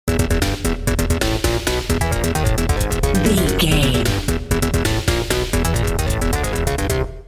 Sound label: intense video game level loop